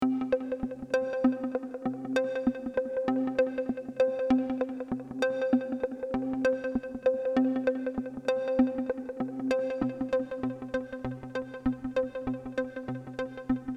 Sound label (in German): Roland juno 60 106 Plugins Vergleich